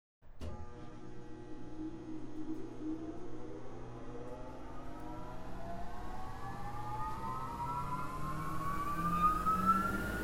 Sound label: blowers; atlanticcity